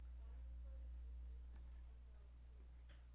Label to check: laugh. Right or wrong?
Right